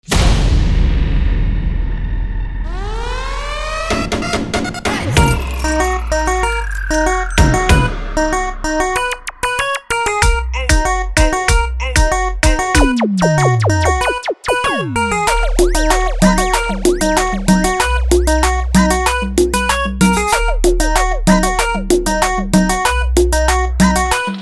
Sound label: OPEN Melody 095BPM